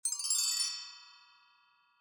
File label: tone